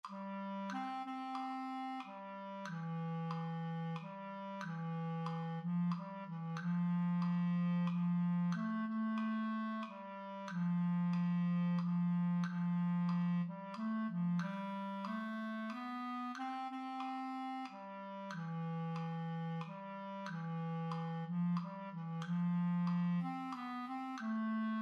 Duettissimo onder

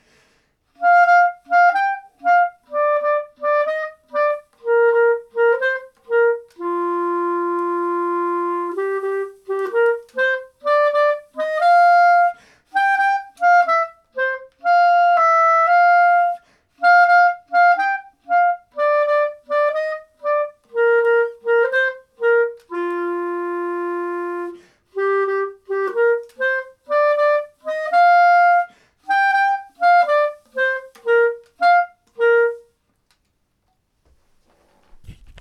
Caribbean Duet bovenstem, saxo; Horen Lezen en Spelen deel 2

